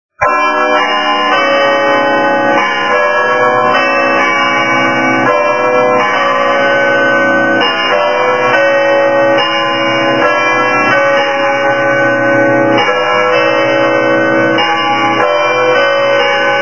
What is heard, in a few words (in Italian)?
campane torre pisa